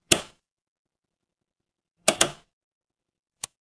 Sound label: mechanical switch